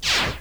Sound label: laser